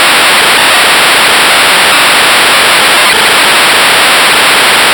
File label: noise 1B